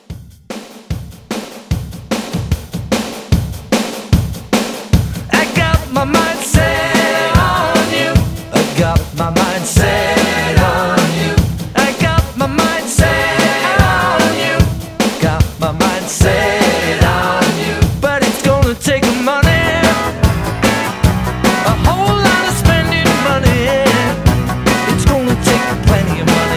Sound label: 80s Rock Re Drum, 149